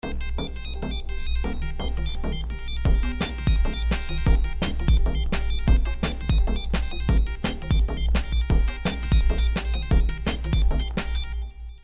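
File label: drum and bass ringtone, electronic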